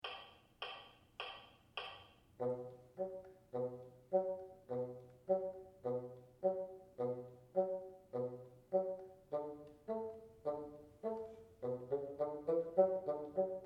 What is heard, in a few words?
top, bassoon; Repeat the vibrato exercise from Unit 48 on the following notes: G1, D2, F#3.